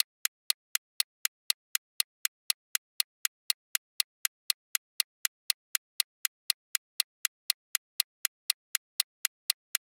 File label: Bomb Timer Clock Count; Clocks, Watches and Timers; Blastwave